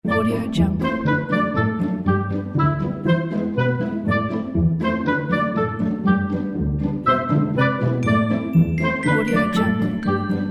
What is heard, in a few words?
magic winter mood